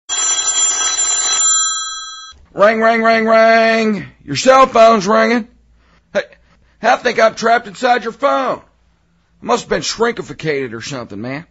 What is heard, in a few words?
Ring Ring; Bush